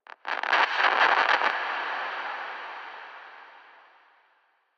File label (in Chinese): 诡异森林点缀声; ambient